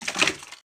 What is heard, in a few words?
wood falling